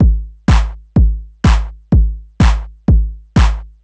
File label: drum breather stripped 125, Classic Chicago House Drum Loops